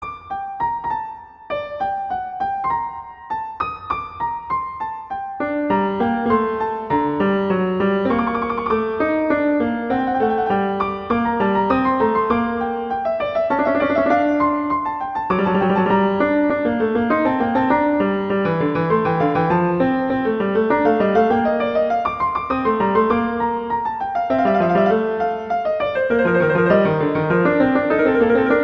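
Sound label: Sonata Vivace Moderato, Piano; Telemann; Canonic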